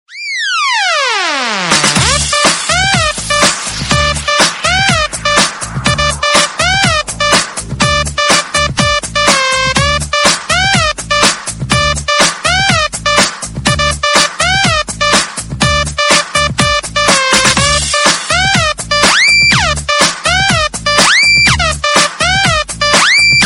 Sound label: party dance background music tone; nokia ringtones